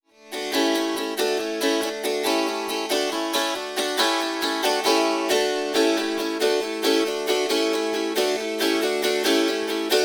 Kantele Playing Strumming First Modified Second Traditional